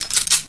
m4 cock

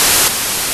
whitenoise; svencoop

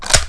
ssg bolt forward; firearms

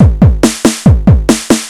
beat, party

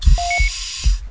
small medkit; gearbox

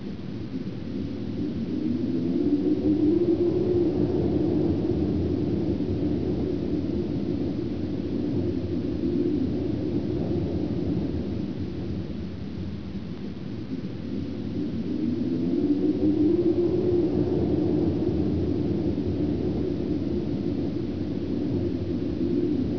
ice slide wind; cstrike

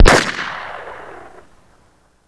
rifle, ambience; gearbox

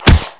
body hit; hl club

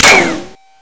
metal, debris

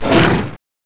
gator bite attack; gearbox

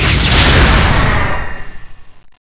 Sting Bomb Explode; cstrike

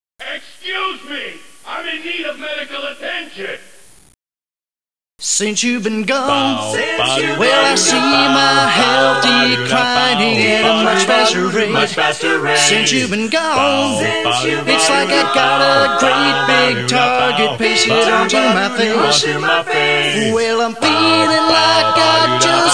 medic song; tfc

